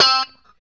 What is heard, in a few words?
POP C 4, STRAT guitar; Spectrasonics; Hans Zimmer Guitars VOL-1; JC-STRAT POP